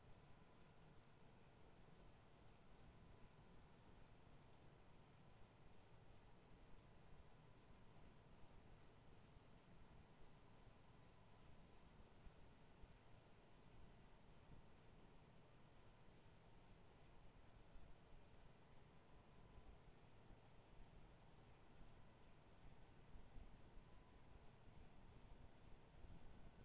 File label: HYDROPHONE 16000Hz; JASCO AMAR HYDROPHONE 740